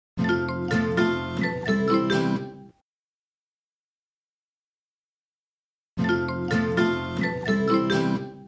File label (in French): sonnerie